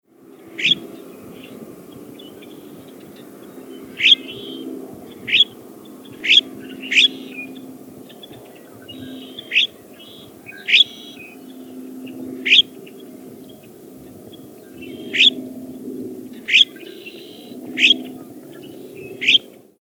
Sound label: Florida Scrub Jay Chreep calls